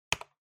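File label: Trackpad Click Right Hard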